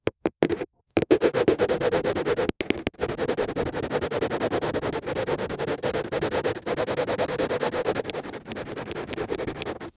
tapping and scratching; textures

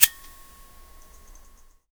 interaction

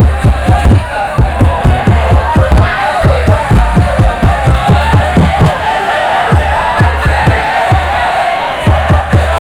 the enviromental sound of, party

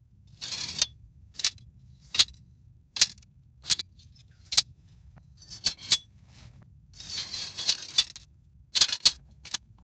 military gear sound

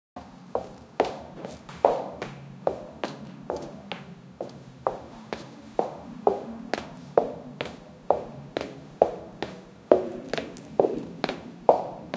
woman walking in high hee; human activity

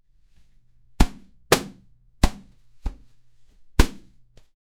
mario jumping, playful

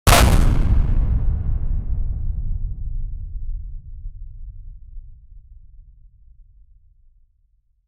short explosive impact; shooter

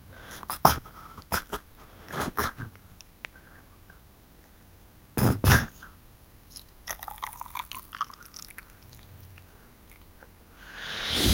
sounds for quiz, correct answer

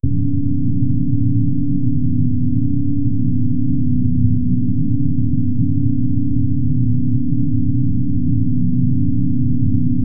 ambient sounds you would, pumps

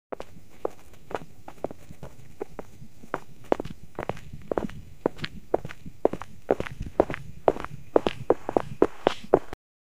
sounds of footsteps that

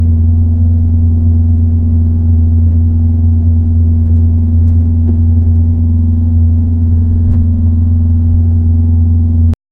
interior driver seat loop, intake

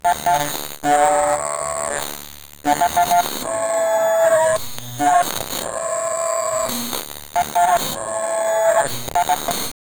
bad word filter beep; censored

